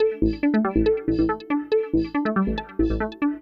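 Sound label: synth electro 140 A; Techno; Keys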